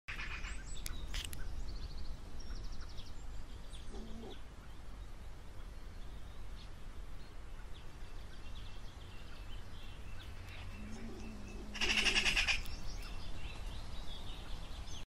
European pine marten; سمور جنگلی

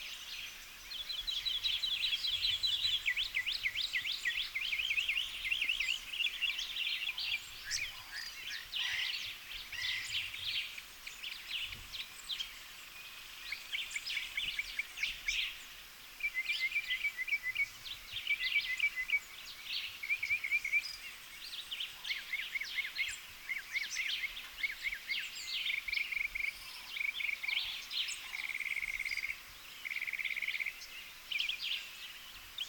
Northern mockingbird

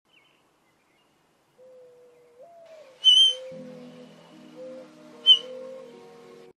Red panda; پاندای سرخ

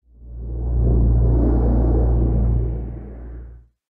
strange scary sounds

